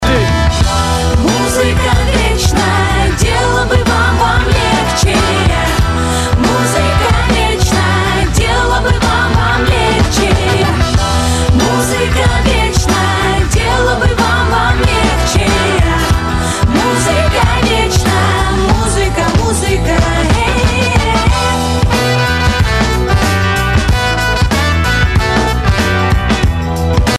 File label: funk